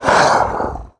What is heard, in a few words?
pain, player